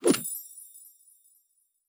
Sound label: Special & Powerup; ArmyRaid; Interface and Item Sounds